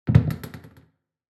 Placing glass on shelf; household

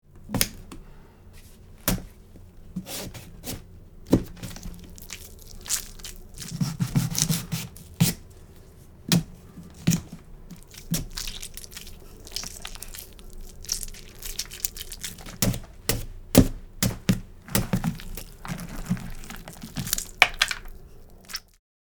Halloween pumpkin carving

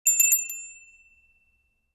Store doorbell chime

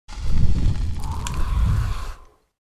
Medieval burning torch extinguish fire

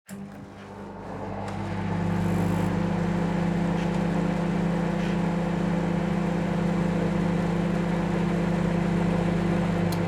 Microwave running soft humming; appliances

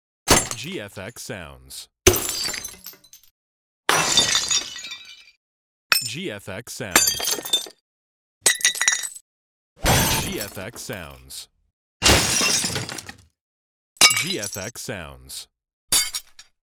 Glass smash

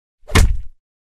Cinematic punch

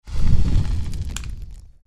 Heavy fire movement flame whooshing

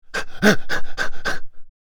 Male excitement moan in pleasure

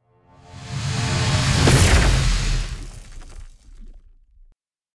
Electricity whoosh transition; cinematic

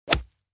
Golf swing hitting a golf ball

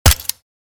M4 assault rifle suppressed shot; gfxsounds

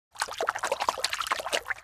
Fishing fish water movement fast splash

